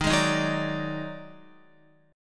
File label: Eb Minor Major 7th Double Flat 5th, Guitar; strummed; chord; x,6,6,8,6,9; JGuitar